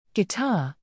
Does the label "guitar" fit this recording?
Yes